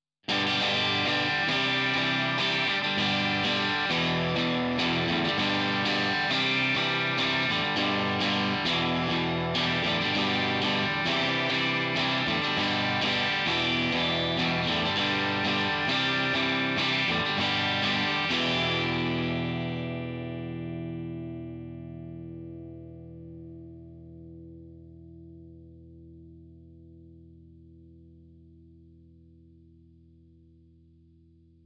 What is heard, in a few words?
Treble Clean; Gitarrenvergleich